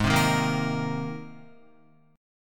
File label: G sharp Major Add 9th G sharp, Guitar; G#add9 strummed; JGuitar